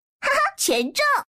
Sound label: one Win